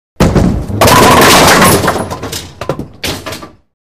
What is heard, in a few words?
Bowling Ball Rolls